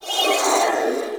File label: Sentient Symbilyst Pain, Barks, Enemies; Warframe